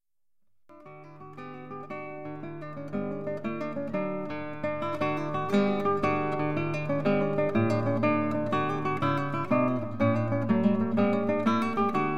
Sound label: Canarios (Canary Jig), Guitar Solos